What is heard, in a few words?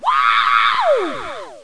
Woohoo Skater; Let's Discover Massachusetts